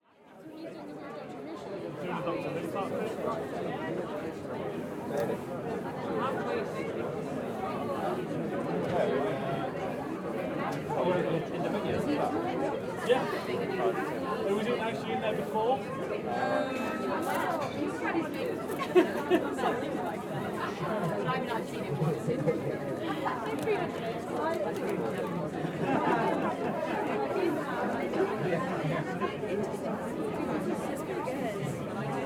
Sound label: AMBIENT, Beer Garden Large Crowd Talk On Benches Exterior; Timothy McHugh; Cafe Ambiances